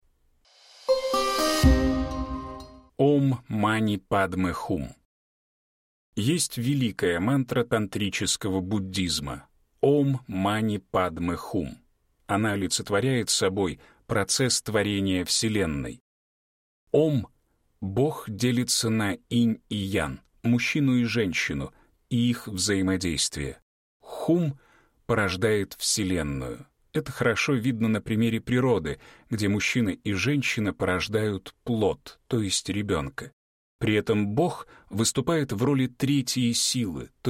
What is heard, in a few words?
om mani padme hum